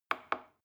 plastic button small click soft slow

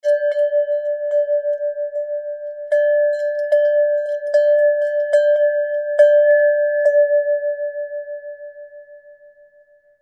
player bells

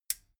metal button stereo click power off

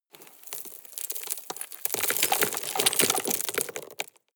drop rock debris medium

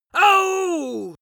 pain compassion male

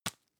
tissue tap hard

